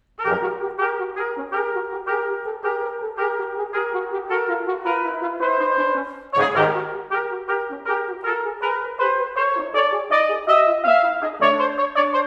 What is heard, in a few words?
brass quintet